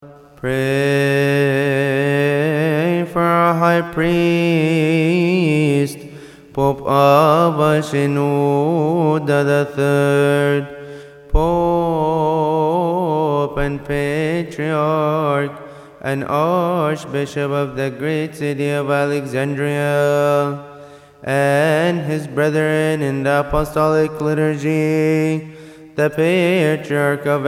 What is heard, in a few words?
Pray for our high priest